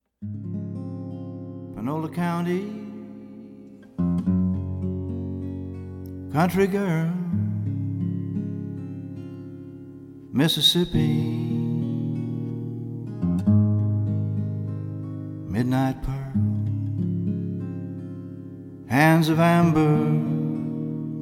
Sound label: Deep Country Blues